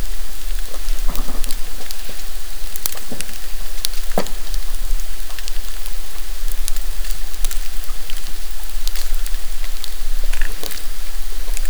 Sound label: TC transient, hydro events